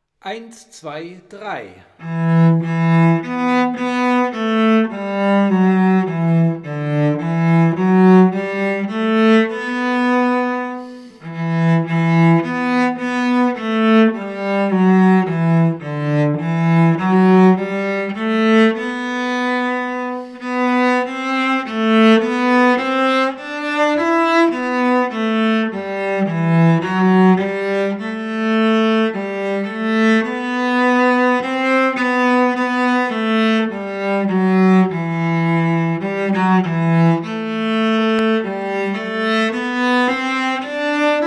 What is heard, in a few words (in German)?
God Rest You Merry Gentlemen Melodie